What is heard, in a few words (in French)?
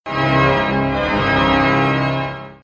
orgue; Quiz